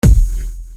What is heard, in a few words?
Kick; Sonic Foundry (Sony Creative Software); Infinite Soul VOL-1